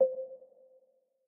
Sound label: Move C 3 Mp; Mixolumia